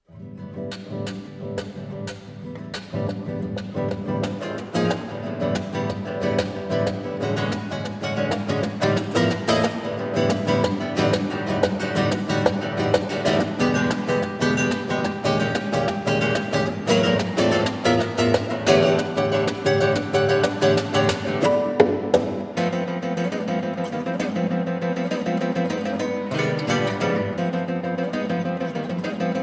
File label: guitar